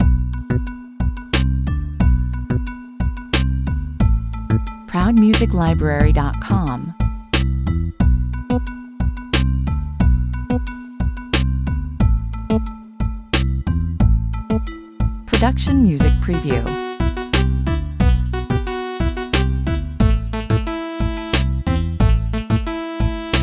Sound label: mystery level, 90 bpm